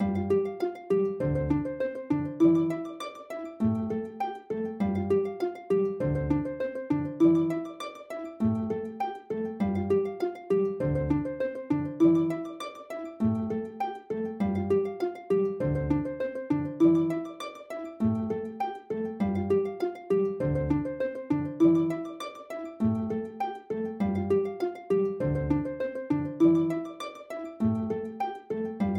spokoynaya krasivaya melodiya bez slov

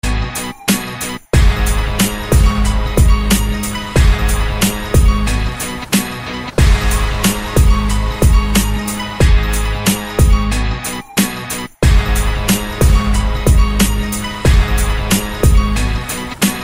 zhestkoe sms

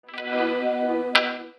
korotkiy zvuk

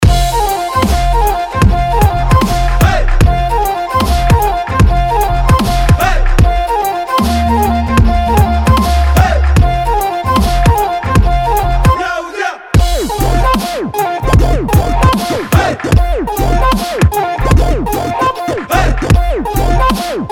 fleyta i bit; klassnyy rington v muzyke

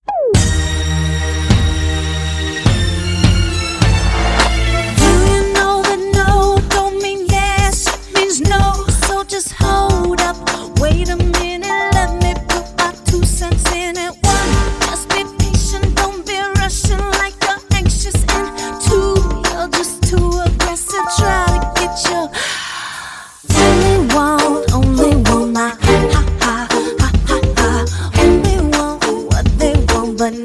beep otryvok pesni

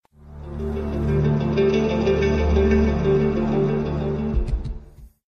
vozdushnoe sms krasivoe sms soobschenie

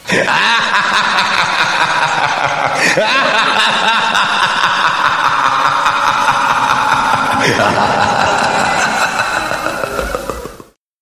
zvuki gromkiy chelovecheskiy smeh

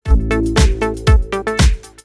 sms kak budto retro

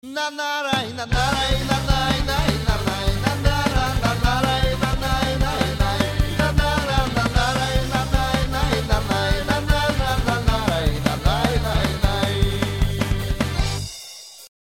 akkordeon

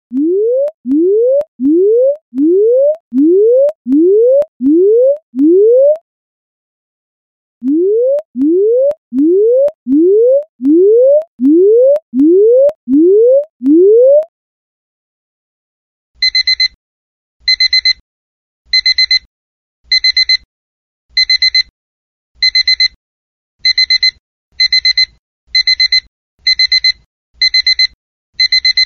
trevozhnyy samsung s4 sms samsung s4

